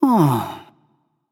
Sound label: nv sigh; smite; xbalanque